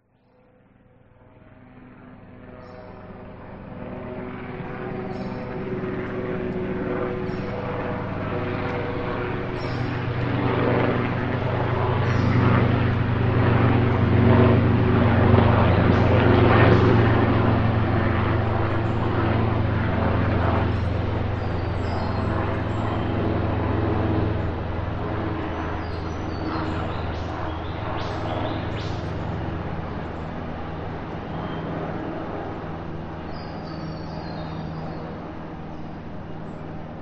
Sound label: vehicles helicopter fly overhead high distance forest some birds